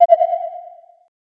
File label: earth beltway